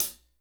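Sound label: hi-hat close, acoustic set; drum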